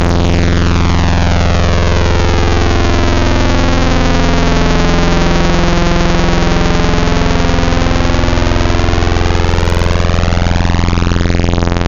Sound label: saw fifth; Synthetic's Serum Collection; Echo Sound Works Core Tables